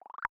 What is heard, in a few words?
Keyboard Down; Justin's New Sound Scheme; Happy Sound Scheme 1